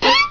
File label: eye move